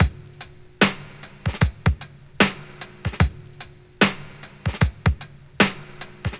75 bpm old school drum loop sample D key, breakbeat - kick tuned to the D note